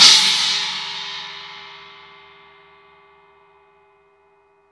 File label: CHINA, Drum Dry; East Collexion; Drum 1 Dry